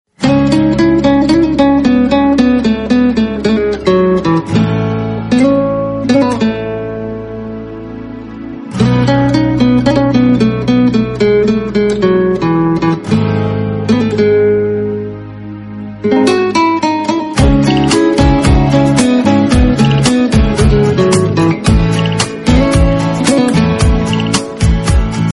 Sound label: guitar of love ringtone